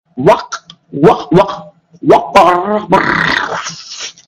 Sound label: tribe chant